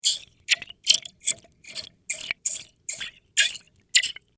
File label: Hydrophone Metal Spring